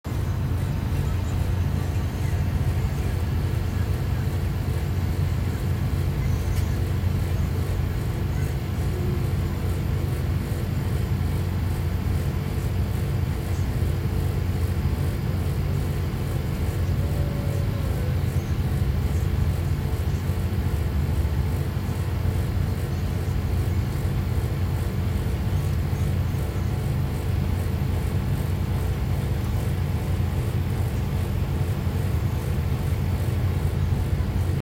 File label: Fridge